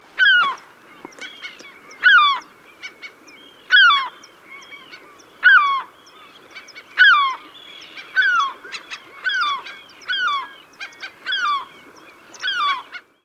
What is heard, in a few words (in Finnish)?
harmaalokki